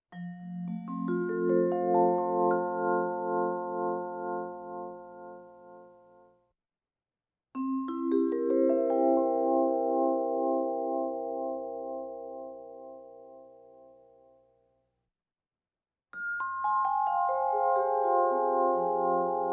Vibraphone Accent UP Scale; Electa Quinney

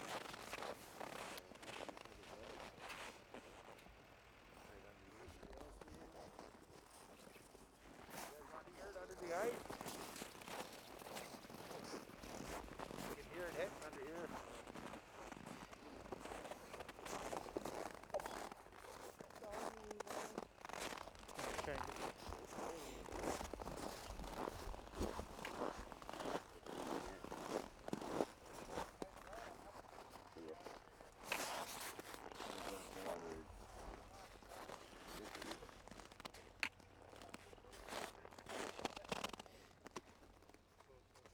Ice footsteps; The Ways - Ice Fishing; Nat Sound Selects

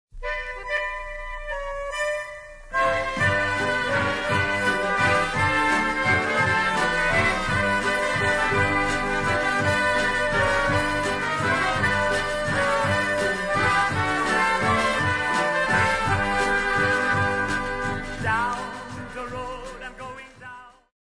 Homecoming Waltz

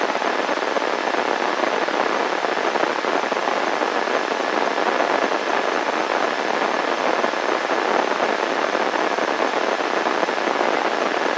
Static White Noise Basic; Poetry Project

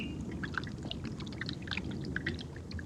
Mississippi Water; Electa Quinney

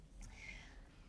Birds; University Place; Why Birds Sing; RX 9 De-click

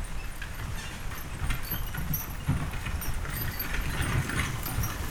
Horse Drawn Wagon By; Electa Quinney

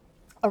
Birds

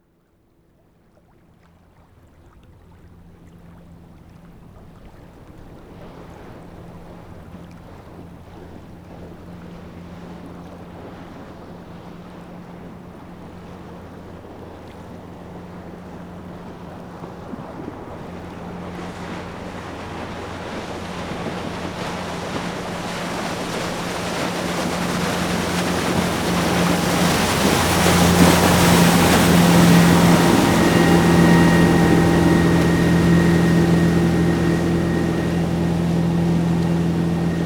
EXT Fish Boat by Near, Water; Poetry Project

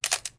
Camera Click 35mm Manual; Poetry Project

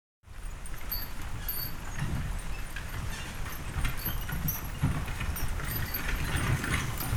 Horse Drawn Wagon By; Electa Quinney

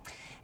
Birds; University Place; Why Birds Sing; RX 9 De-click

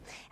Birds

